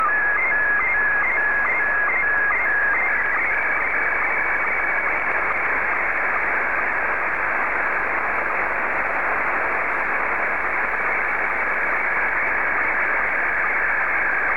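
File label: Scottie 1, slow-scan television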